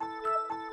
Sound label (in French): Ouverture de session, Windows 7